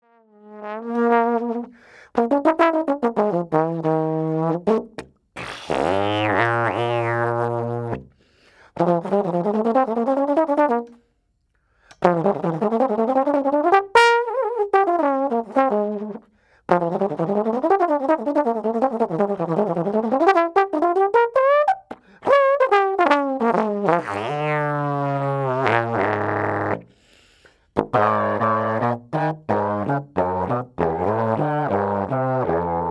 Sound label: Warming Up